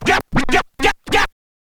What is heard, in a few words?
scratch kit, vinyl